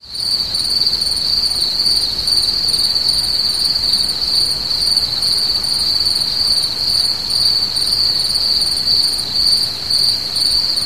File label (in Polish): koniki polne